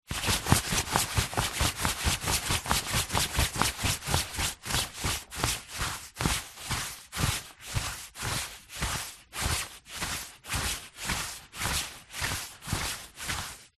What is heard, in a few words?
foley coat winter thick running into walking movements arms